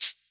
Korg N1R Cabasa

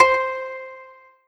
Kawai K5000W Koto C5

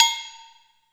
Low Agogo

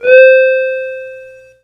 Yamaha PSS-170 Whisper C5

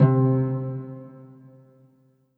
Korg M3R Harp C3

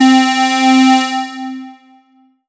Yamaha TG77 SqrMono Lead C4

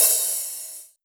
Yamaha RM50 Open Hi Hat

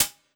Yamaha FS1r Drum Kit Closed Hi Hat

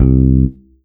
Korg TR Rack Finger Bass C2

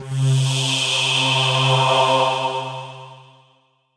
Roland GR-1 Breathy Pad C4

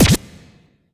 Vinyl Scratch

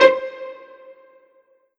Korg 01W Pizzicato C5

